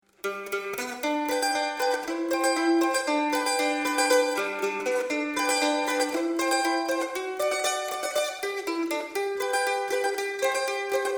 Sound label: pickbrush